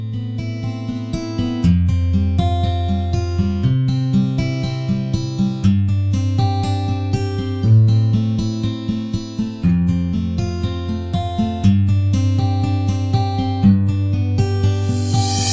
Guitar mix

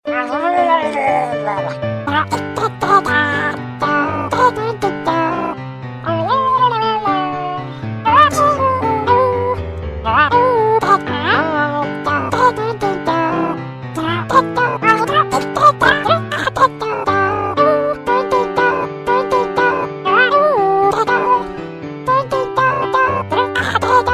Jingle Bell

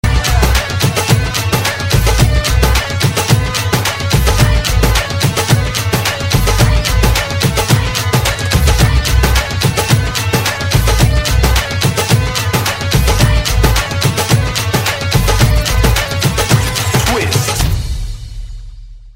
Twist Tabla

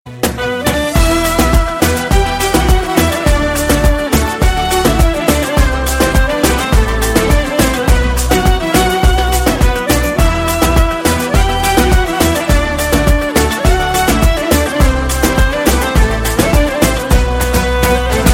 balkan musica

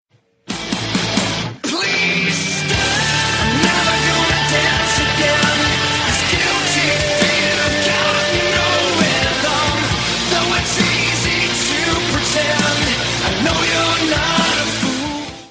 Whisper

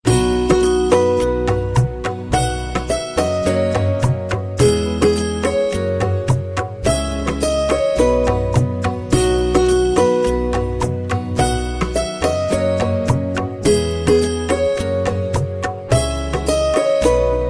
Santoor Majira Tabla

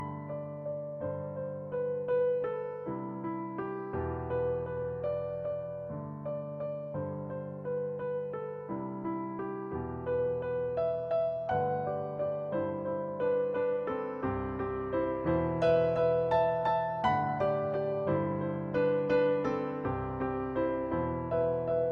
Lullaby; Bellas

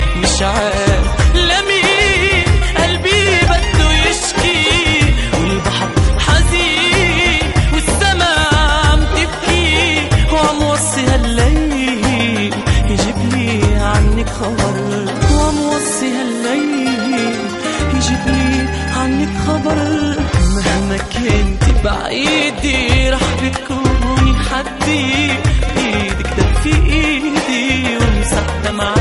Arab Sad Love Song

